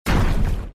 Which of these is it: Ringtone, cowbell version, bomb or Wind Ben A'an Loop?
bomb